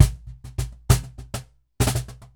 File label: Cajon Loop 100BPM; musicradar